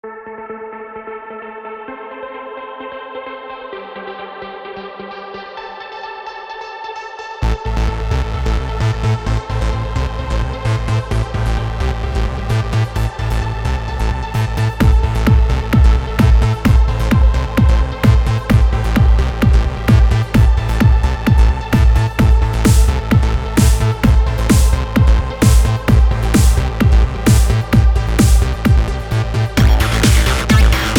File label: noise coupling 130bpm; weeklybeats-2016